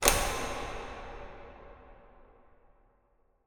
industrial light switch on large empty warehouse reverberant